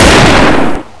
MP44 shoot; dod

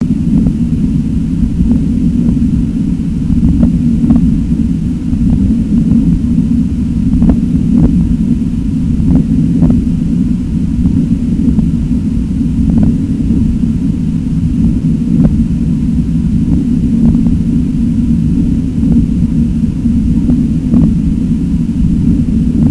drone; svencoop

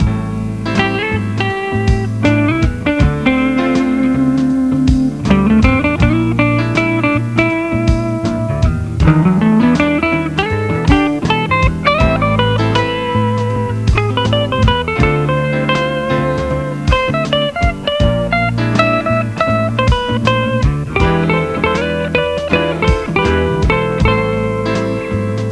jazz

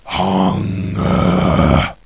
hunger, zombie; brainbread

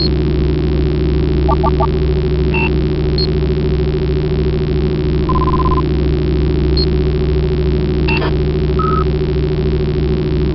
computer loop; svencoop; lab

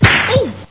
hit

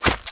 stomach

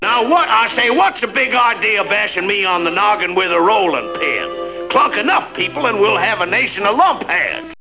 fog ouch

